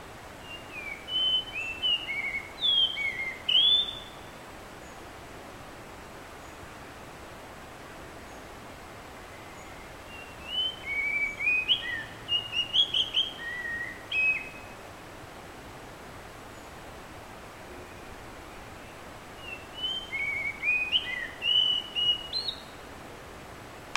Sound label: Petrophila gularis